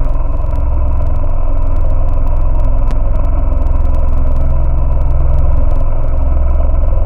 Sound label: vocal voxus; Synthetic's Serum Collection; Echo Sound Works Core Tables